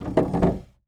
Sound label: Dresser Drawer Opened